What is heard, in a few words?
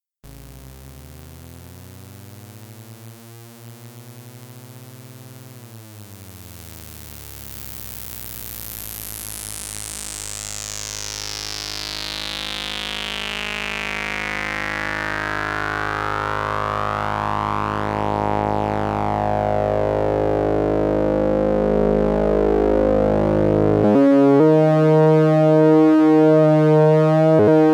VCO mod by ADSR, synthesizer; Dominion1